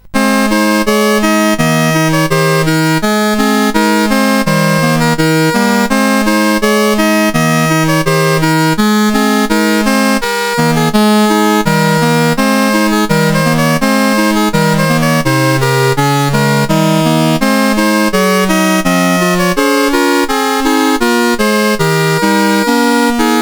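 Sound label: Rollin, MOS 8580 SID chip music, games; CSG8580R5; hvsc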